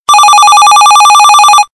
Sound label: super loud ring